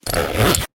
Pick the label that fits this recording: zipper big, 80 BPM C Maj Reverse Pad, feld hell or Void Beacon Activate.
zipper big